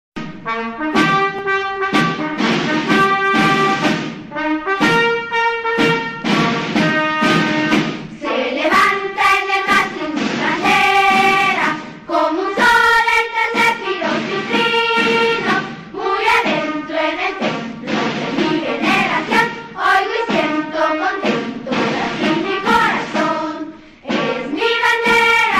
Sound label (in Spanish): Toque de bandera